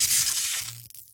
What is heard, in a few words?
Enemy Factory Active Loop; Expansionball Zap Loop; Se Sp Expansionball Zap Loop; gamerip